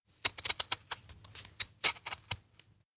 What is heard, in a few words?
apple slice thin; koyomi